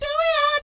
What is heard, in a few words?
DOOEEOT, Vocal Jazz Fx